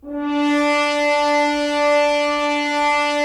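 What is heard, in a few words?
Solo French Horn, brass; Roland - LA Composer vol. 4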